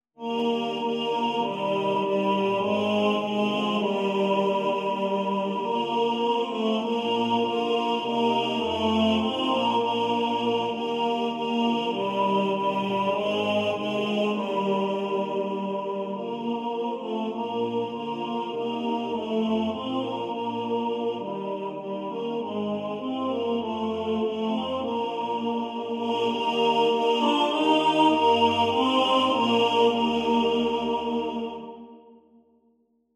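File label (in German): Tenor 2 im Vordergrund, Männerchor; Jesus Christus Gottes Sohn; Satz Karl Wezel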